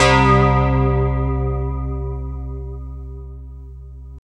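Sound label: synthesizer clear, digital; Sound & Vision - Gigapack I CD 2 (Roland)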